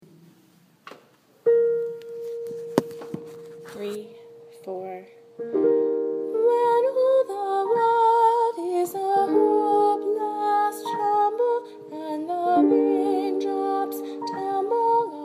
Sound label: soprano